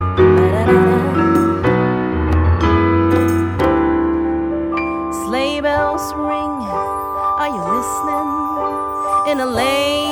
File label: Winter Jingle